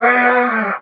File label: vocal polar Em; SD AURORA Sample Pack; Slate